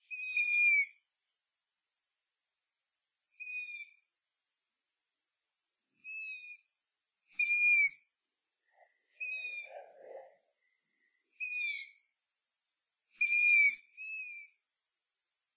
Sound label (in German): waldohreule junge